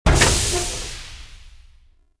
probe door open; movers objects

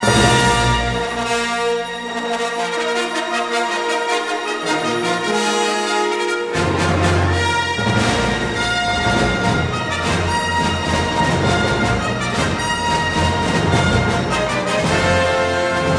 main title, music

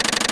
option scroll

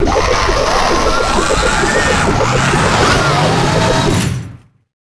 spin claw; weapons; galak

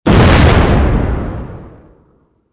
boom, mm1; weapons; Soldier Of Fortune II Double Helix; XBOX

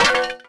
bouncy big plant hit; bouncy objects; Tony Hawk's Project 8; XBOX

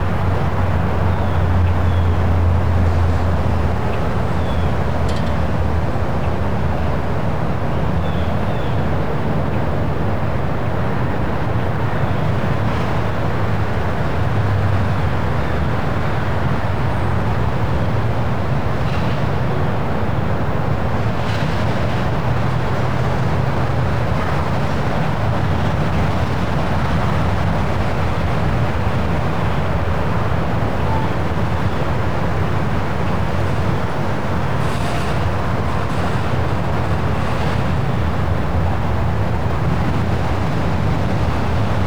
city medium, Ambient; Project Gotham Racing

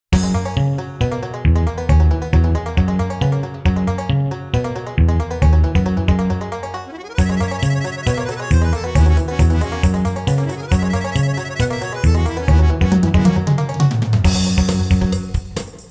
Pinball, Music; Pure Pinball

